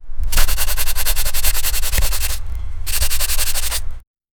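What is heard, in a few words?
paint brush stroke to paper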